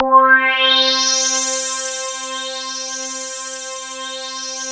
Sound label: INV FILTER